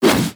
melee pre attack; terrorblade

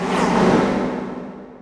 fatal bonds precast; warlock

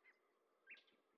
monkeys; ambient soundscapes; dota

